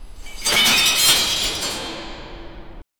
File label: screeching metal shatteri; tunnel